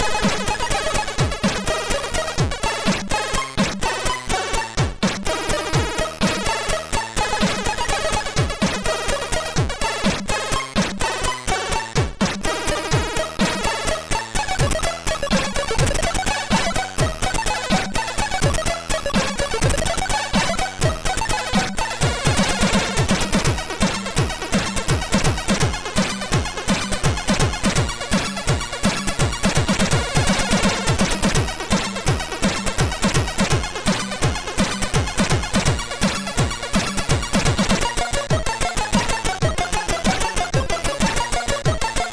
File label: sid MOS6581R3